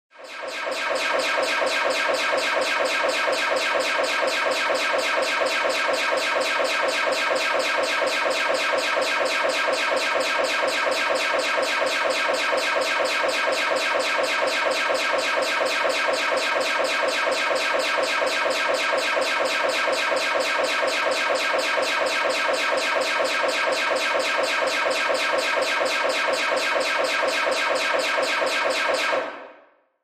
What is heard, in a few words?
Alarm Damage Alarm